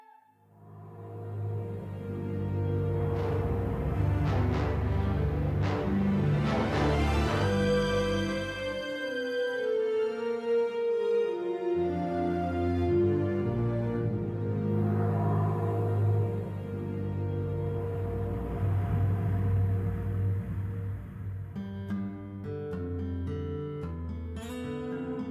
Music Only; Electa Quinney

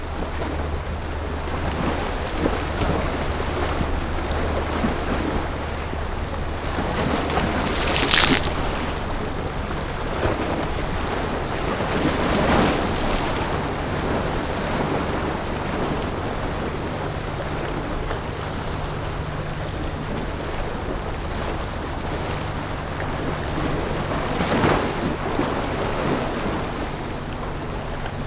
EXT Jet Boat Cruising; EFX; Jeti Boat Cruising; Poetry Project

